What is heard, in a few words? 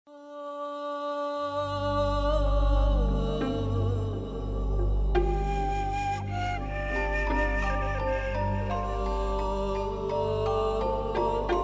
Desert Road, oud lounge